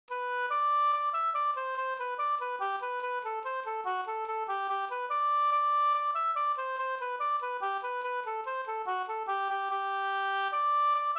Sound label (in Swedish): hambopolkett; Stackars den pojk Sd e Ingemar Skoglund